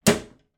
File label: household bathroom cabinet door close